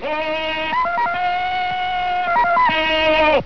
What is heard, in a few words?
tarzan